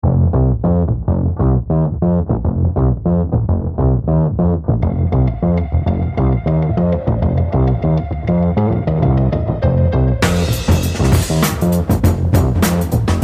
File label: 1950 K Upright; Sample Fuzz